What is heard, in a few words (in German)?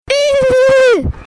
Pferd; harlaching